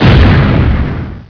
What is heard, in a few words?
big explosion